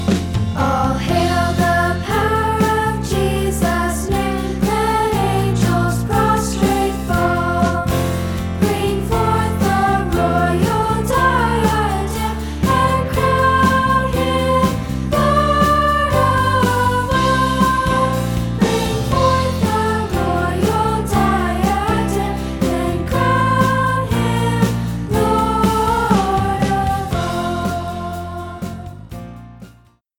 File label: All Hail the Power of Jesus Name instruments